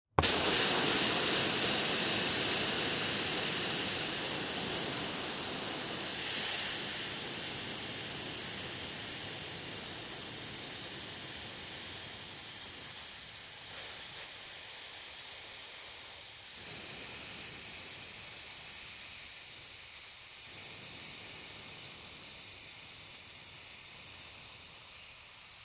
Impact Auto Tire, Impact, Automobile, Tire, Puncture, Release, Air; Crashes; Blast Wave